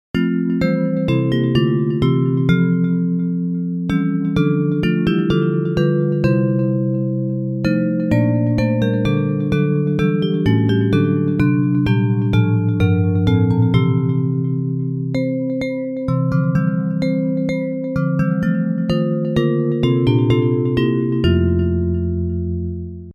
Rejoice Ye Pure in Heart Bells; Hymns; Praise

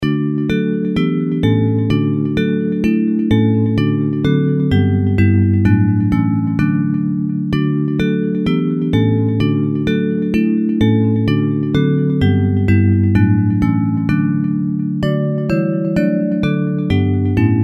Descending Bells, Hymns; Now on Land and Sea Descending

